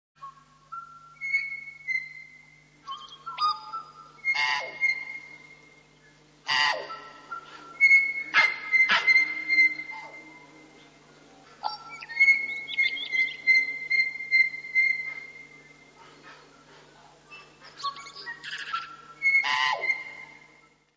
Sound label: tui